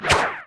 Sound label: crit attack; LoL; urf rider corki